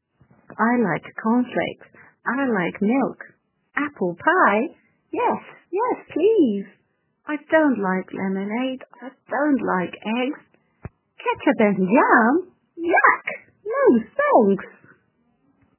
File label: unit 3 chant